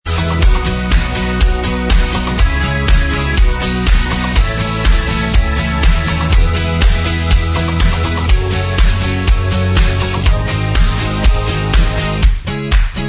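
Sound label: instrumental track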